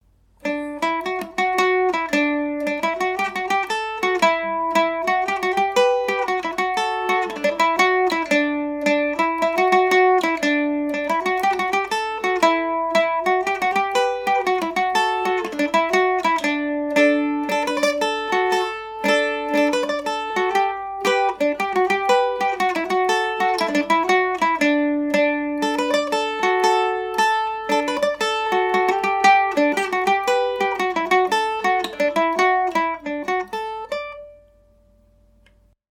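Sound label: D major md304, irish mandolin; johnny mickeys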